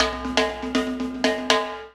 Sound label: Snare; ACID MUSIC; Drums; Timbo